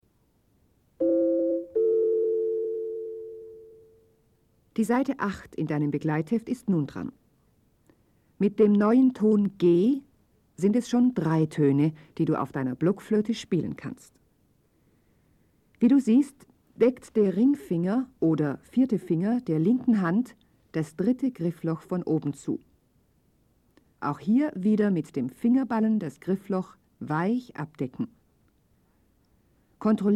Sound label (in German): Spiel Blockfloete